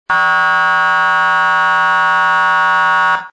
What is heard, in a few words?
PA-300 Airhorn